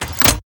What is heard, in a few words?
Lever Down